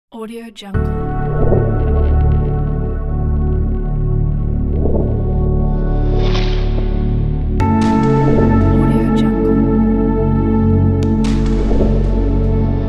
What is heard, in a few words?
cinematic ambient intro logo pack; puremusic